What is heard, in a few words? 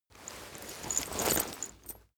leather metal handling long